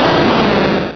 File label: cry